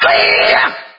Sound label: attack scream; geo